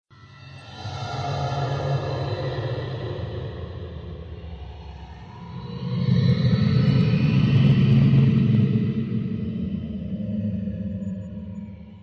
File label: ambient